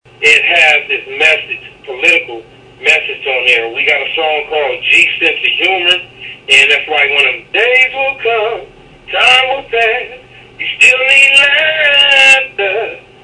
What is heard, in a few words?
singing